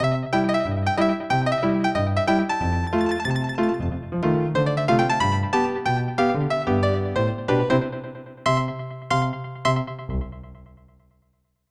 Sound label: Piano; Treasure Hunter G; SNES; Piano Skill Level 4